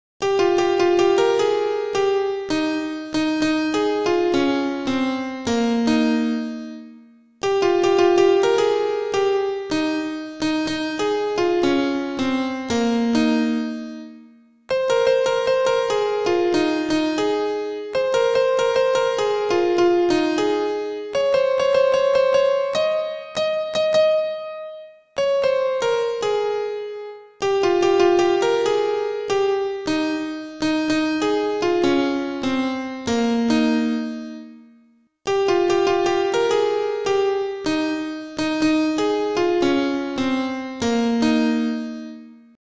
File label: bangla movies piano song; romeo movies